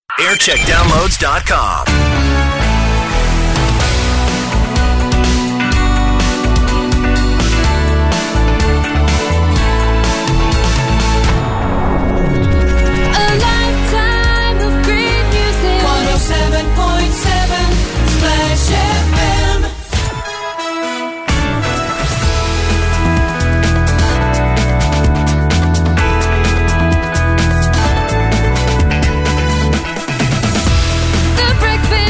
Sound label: Jingle Montage; Splash FM; TM Century